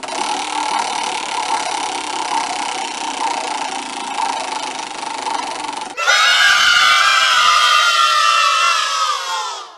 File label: Roulette play sound effect; spinning wheel